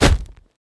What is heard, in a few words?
Bag 50 Pounds Throw